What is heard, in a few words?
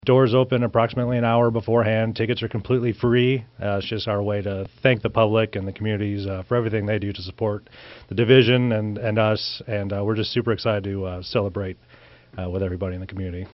Brass; 1st infantry division band; holiday concerts